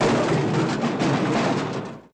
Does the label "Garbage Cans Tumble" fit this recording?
Yes